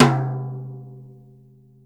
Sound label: TOM, Drum Dry; East Collexion; Drum 1